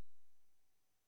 clip inser

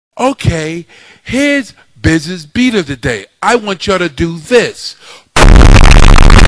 bass

